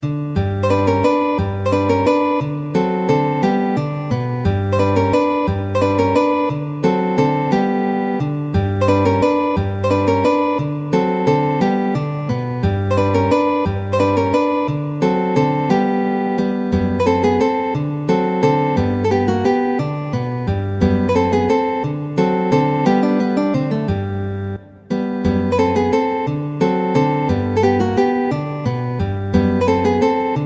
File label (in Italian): valses, guitar